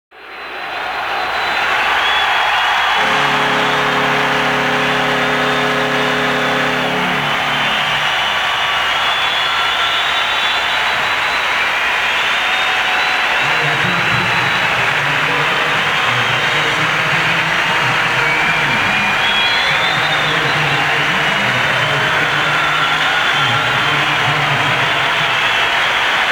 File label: aud horn